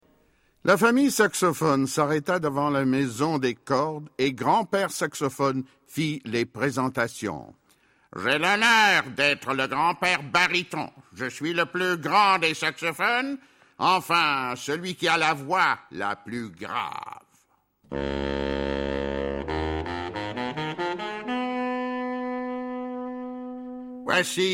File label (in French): saxo; piccolo saxo